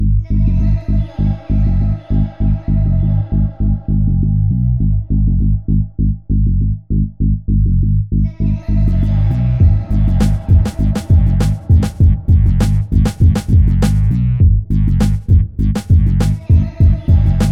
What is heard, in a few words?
BEAT